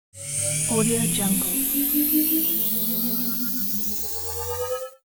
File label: power up ascending; hollywoodedge